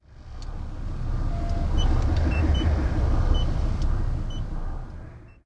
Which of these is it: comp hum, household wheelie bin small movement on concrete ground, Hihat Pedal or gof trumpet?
comp hum